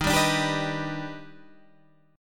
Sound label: D sharp Augmented Major 7th, Guitar, Open G; 13,12,12,12,12,13